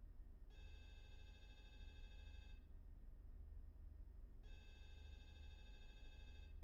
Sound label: phone; IEEE AASP